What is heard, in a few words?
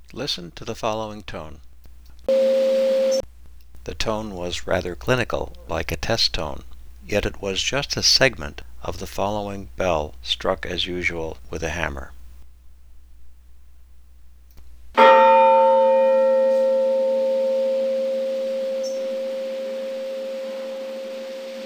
Bell Segment